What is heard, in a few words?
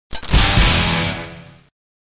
LIFT MACE; Full Throttle; ScummVM